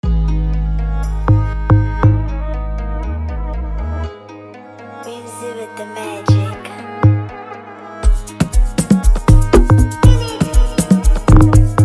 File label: Amapiano Instrumental